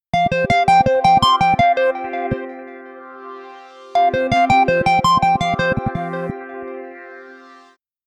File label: Stereo Teleport, ringtones; Motorola; Edge 70 Max China